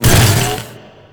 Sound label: small explosion